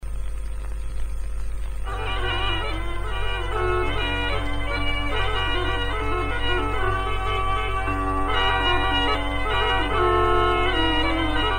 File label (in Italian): canzone a curba, canti monodici accompagnati; cabras or